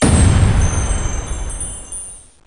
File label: heart bomb exp; zoochosis; panda